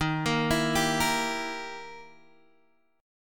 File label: E Minor Major 7th Flat 5th Eb